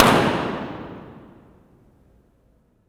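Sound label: Impulse Response, San Francesco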